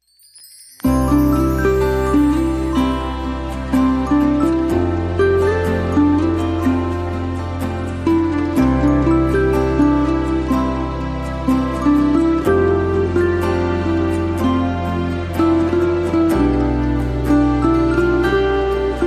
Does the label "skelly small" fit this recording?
No